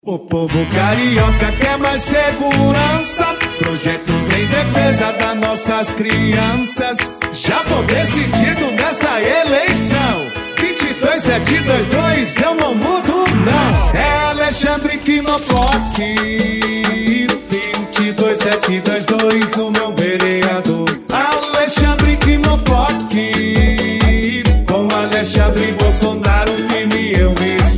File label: Jingle